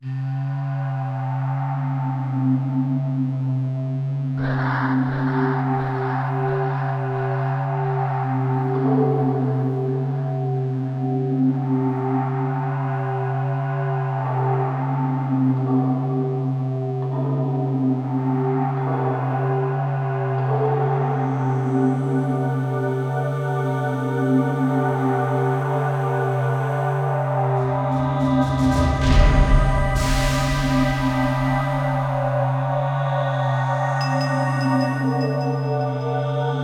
Machine Beat; ps1 gamerip 1997; Stage2 #1 - Armored Train

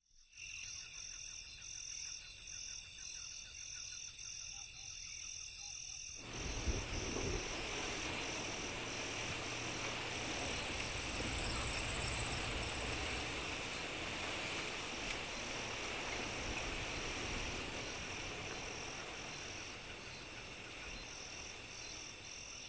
Amb Night; Alien Shooter 2

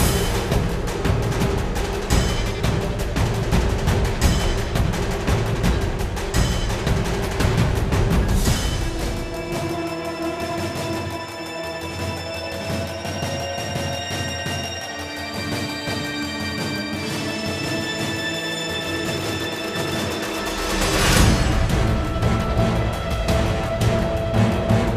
Drums Ambience; Assassin's Creed III

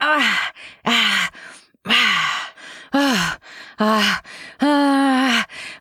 Moan REV; Devour; Anna

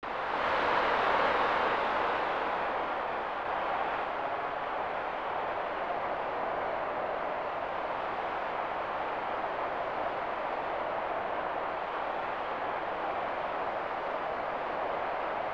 Crowd; WWF Raw; SNES

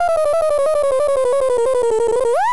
Item Card Fail; Hyrule Warriors Definitive Edition

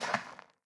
sand footstep; adams venture origins

